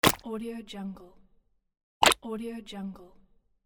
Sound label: slime splat